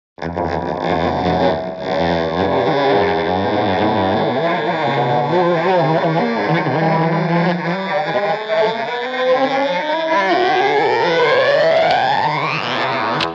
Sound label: Grating Guitar String